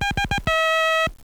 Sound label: tone